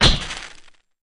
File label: Camera Flash Bulb